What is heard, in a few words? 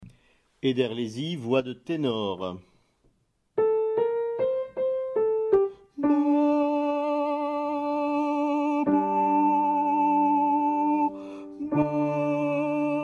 Ederlezi tenor